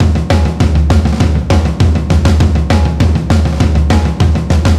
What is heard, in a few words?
Punktom, ударных rock pop; сэмплы ударных